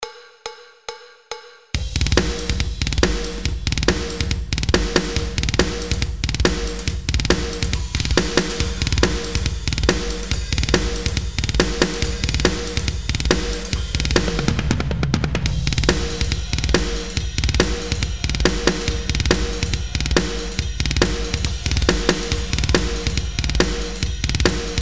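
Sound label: drums compressed; taldrum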